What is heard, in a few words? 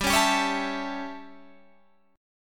A 7th G, chord